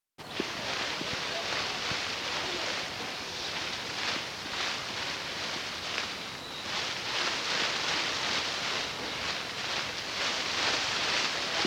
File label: Leaves Rustling